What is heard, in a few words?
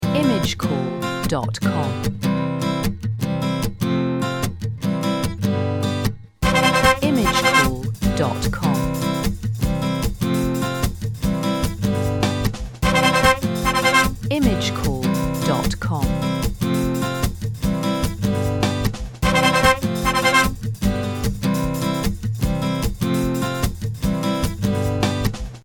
stock audio beat